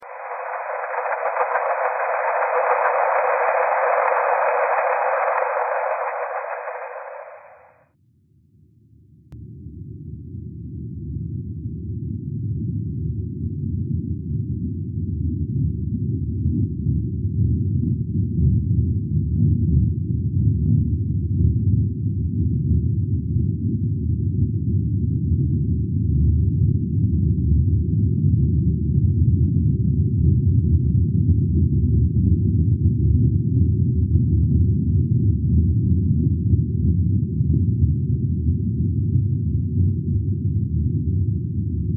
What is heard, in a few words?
thread pentatonic